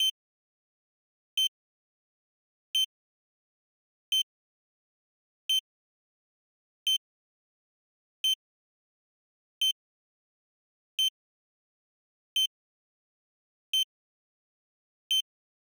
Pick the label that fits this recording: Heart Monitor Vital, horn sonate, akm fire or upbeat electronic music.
Heart Monitor Vital